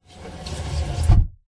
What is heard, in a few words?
wooden box crate lid slide close recorded from inside